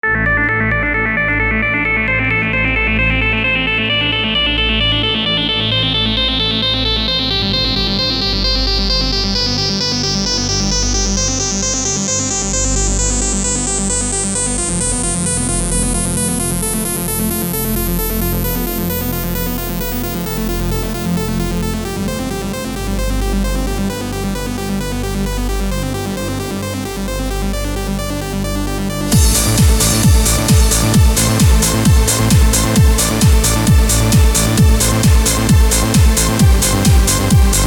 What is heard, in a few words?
Trance Loop